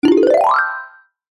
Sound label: cartoon ascend climb fast mallet; zapsplat